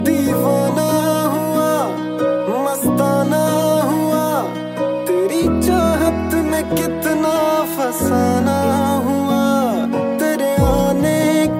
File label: Sad song